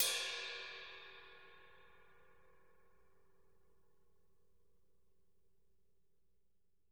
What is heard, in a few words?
ride